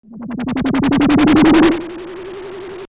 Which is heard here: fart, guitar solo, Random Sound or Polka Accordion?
Random Sound